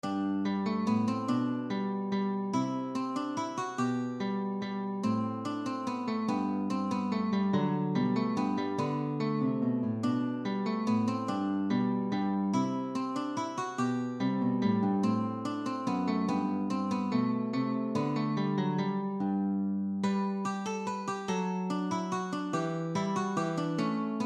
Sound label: minuet; bach